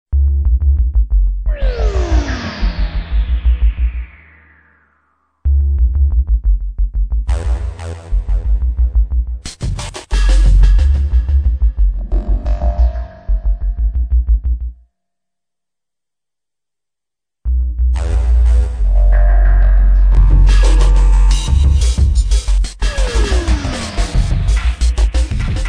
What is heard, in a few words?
Mega Bass